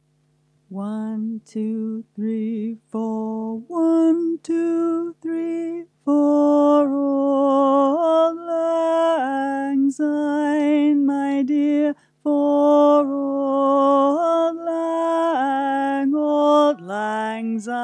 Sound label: Auld bari chorus; Auld Lang Syne Resources